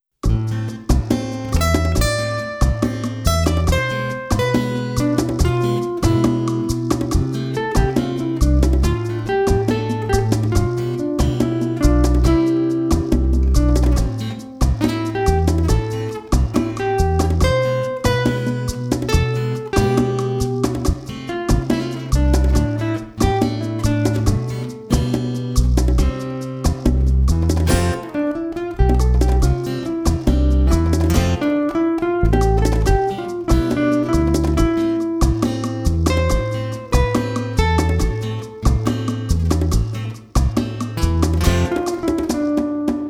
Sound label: Spanish Style